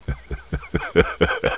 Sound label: Chuckle